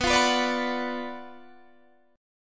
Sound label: F sharp Major 7th Suspended 4th Sharp 5th B